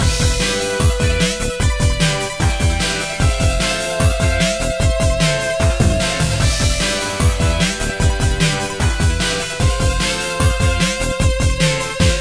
cyberpunk normal battle boss

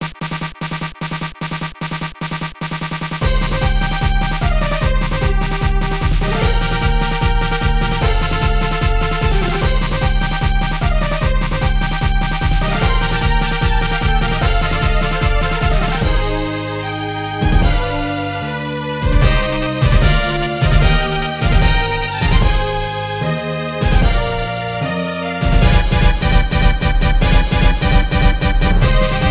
battle normal

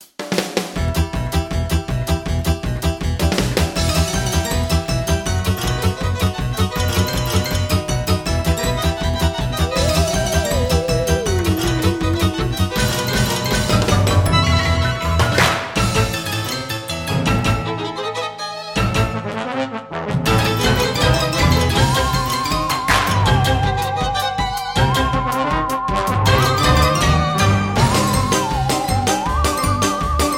halloween story rush

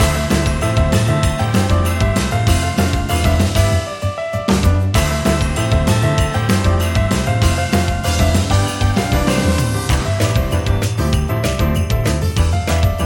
battle christmas boss

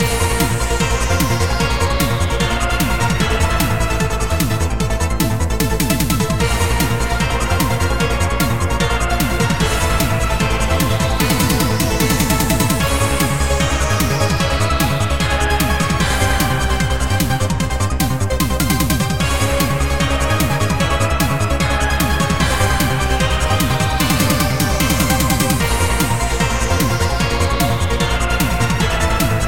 cyberpunk midboss battle

